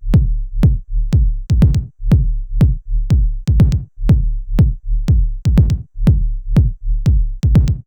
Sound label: Knobula Kickain Mono Loop Acc